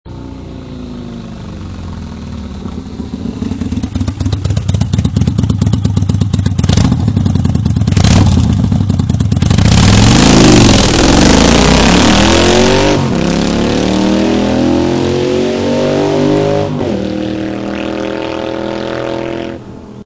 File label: Honda Shadow ACE750 V-Twin engine; Section Six: The Soul of a Bike – The Engine